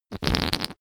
whoopee cushion fart low

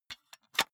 cassette case close soft

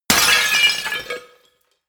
glass smash

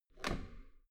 metal door heavy handle up

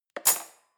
cutlery fork drawer handle place

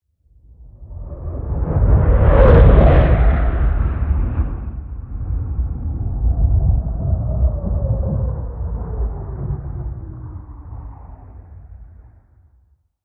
air strike

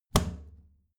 plastic trash can close heavy muted

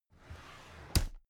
drawer cabinet carton open regular

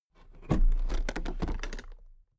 toolbox open regular

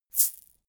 shaker plastic egg hit single hard

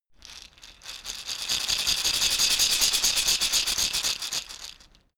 shaker wood rough rattle dynamic

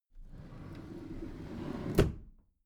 packed drawer smooth open soft

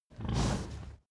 pig grunt breath

